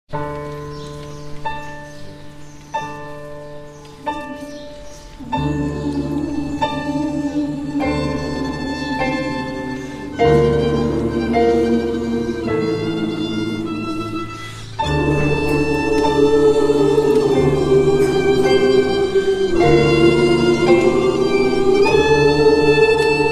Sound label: Chorus; Meherabad